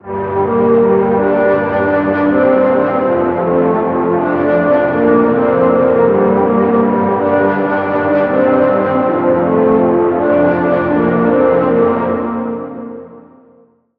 Key - Bury; Routed Sound; Vital Expansion - Street Vanquisher Vol.1

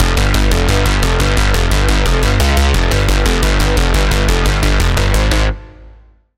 Pluck

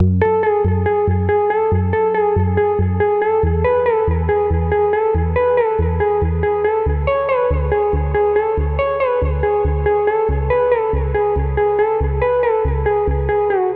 Key Nostalgia Phase; Routed Sound; Vital Expansion - Star Scavenger Vol.1

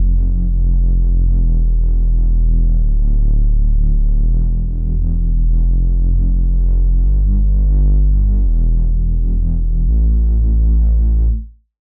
Bass, Serum 2; Bass - Under Riot; Routed Sound; Serum 2 Expansion - Flame Summoner Vol.1

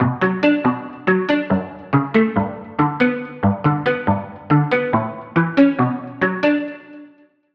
Pluck, Slide; Slide Of Moonlight; Routed Sound; Vital Expansion - Flame Summoner Vol.1

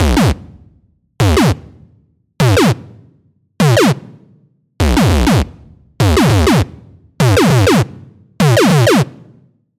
SFX Digit Fireworks; Routed Sound; Vital Expansion - Hyper Gamer Vol.1

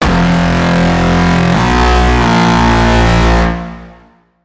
Braam; Joltrealm; Routed Sound; Vital Expansion - Quantum Enforcer Vol.1

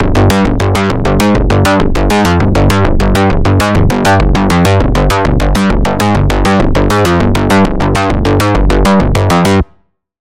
Pulse

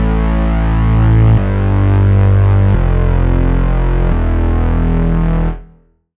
Bass, Sylenth1; Routed Sound; Sylenth1 Expansion - Flame Summoner Vol.1

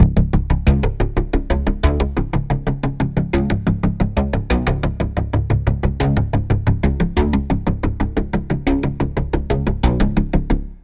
Pulse; Routed Sound; Spire Expansion - Quantum Enforcer Vol.1

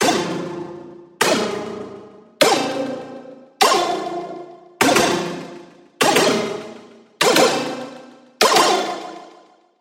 Round Start; SFX; Routed Sound; Spire Expansion; Hyper Gamer Vol.1

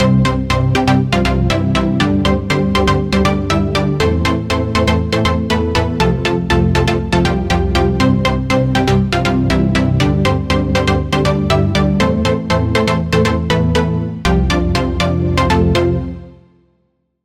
Pluck; Routed Sound; Serum 2 Expansion - Star Scavenger Vol.1

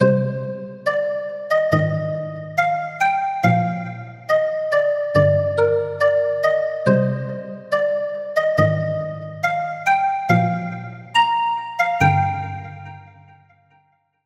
Woodwind; Love Banquet; Routed Sound; Serum 2 Expansion; Story Walker Vol.1

